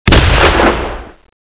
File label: grenade boom; gearbox; demoman